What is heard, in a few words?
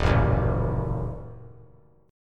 D sharp Diminished D sharp; D#dim strummed; 3C,3F,3A,3D; 3,1,0,1; JGuitar